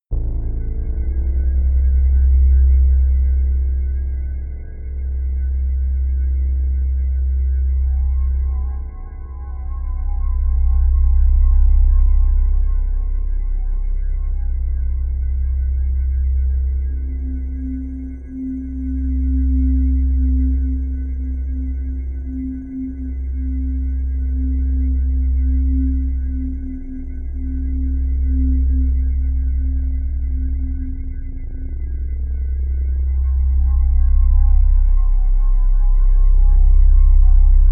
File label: drone mystery